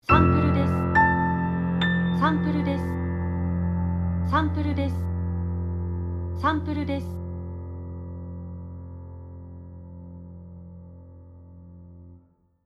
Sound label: Piano